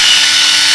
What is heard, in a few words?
whirring blade; rustbit